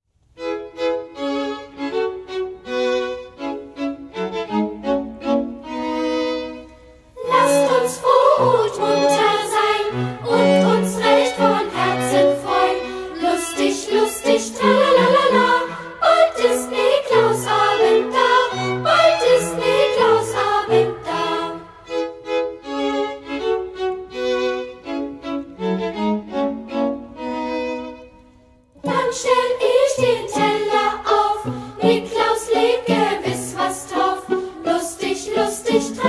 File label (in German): Kinderchor